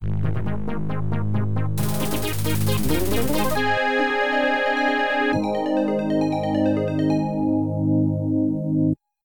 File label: Fanfare; Hocus Pocus; Apogee